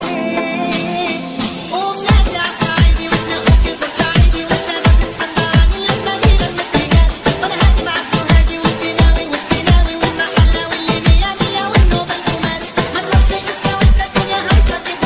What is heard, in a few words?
arabic kyuchek ringtone; arabski